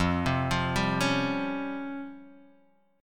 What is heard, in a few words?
C sharp Major 7th Flat 5th F